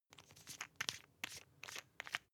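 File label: toothpaste open rattle, Bathroom; haus-garten; badezimmer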